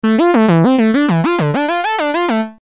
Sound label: porta